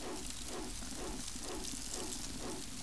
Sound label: song; littlehouse